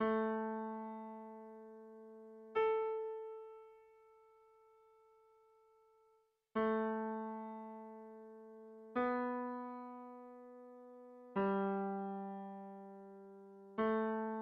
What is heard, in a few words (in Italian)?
Dettato Melodico